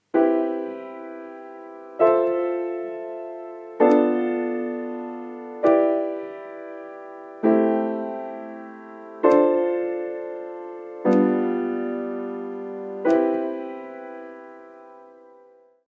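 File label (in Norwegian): firklang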